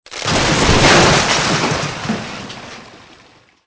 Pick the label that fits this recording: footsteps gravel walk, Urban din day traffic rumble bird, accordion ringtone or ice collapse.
ice collapse